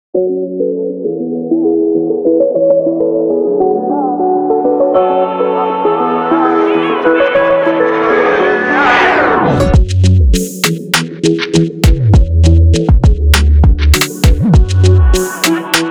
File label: afro trap; splice sessions